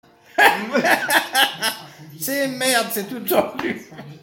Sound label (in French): eclat de rire